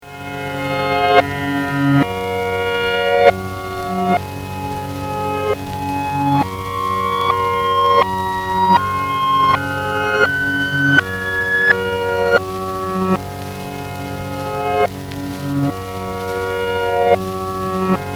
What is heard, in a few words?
reverse